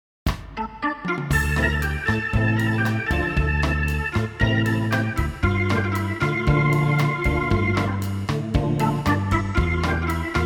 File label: Bossa Nova Organ; jazzy